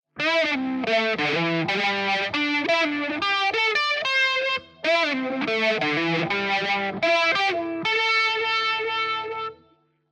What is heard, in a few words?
solo modern; mxr srlp; ampsims